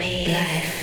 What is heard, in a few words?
just plain scary